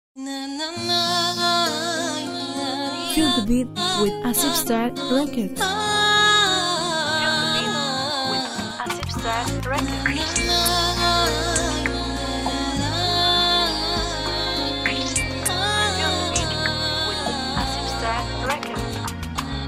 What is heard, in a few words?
BEAT